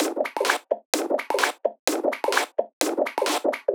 perc 128, Electro percussion loops; Anthemic

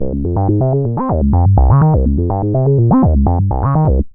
BASSRUN 124, BASSLINES

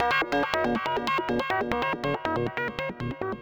TECHNO EXTRA 140

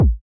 Kicks; Touch Loops; CosmicFunk

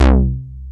FAT SEQU. C2

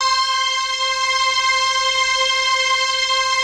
PAD

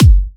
Monster Kick, Drums, Kicks

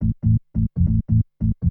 TECHNO BASS 140 A

